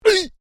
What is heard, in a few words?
MALE VOCALIZATION EEGH